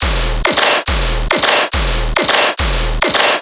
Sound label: Bass Loop D# 140BPM, Dubstep; Sailing Bass; Ghosthack - AC2024 - Day 13 - Dubstep Sounds 2025